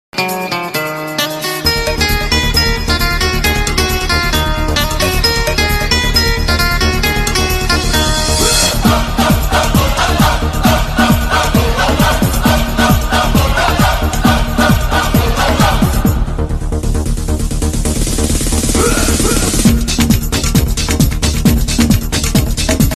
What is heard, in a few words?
music